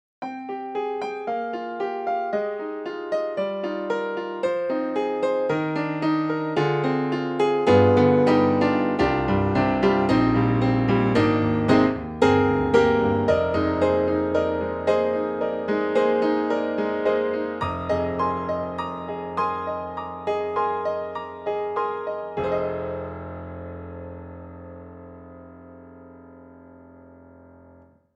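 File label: Japanese Grand, piano; KORG KRONOS 2; J Rudess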